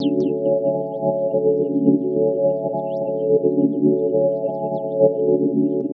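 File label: Pad Synth; Equipped Music; Loungin House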